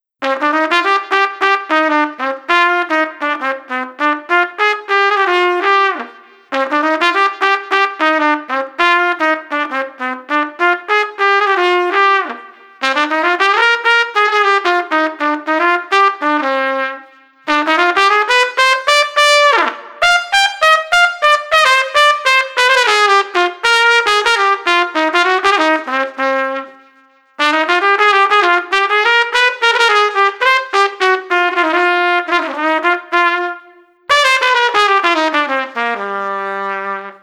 Street Trumpet Mellow Swing 100

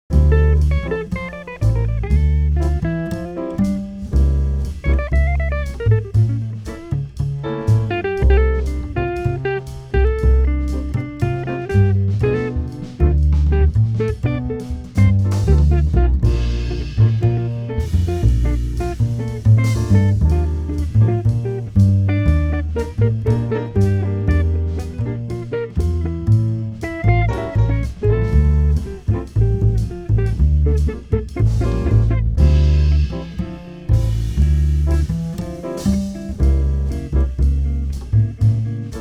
Restaurant Jazz Club Smooth

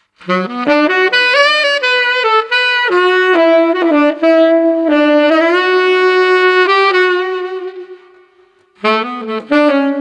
Street Tenor Sax Thoughtful 105

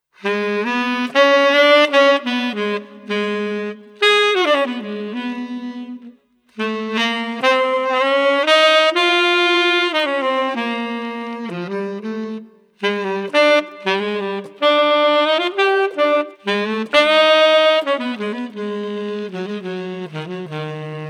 Street Sax Melancholy

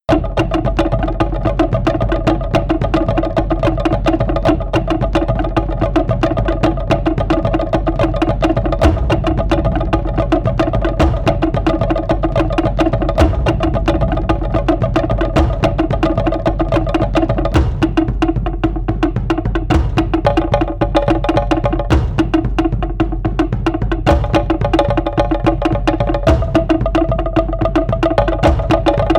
Hawaiian Percussion Exiting Night 110

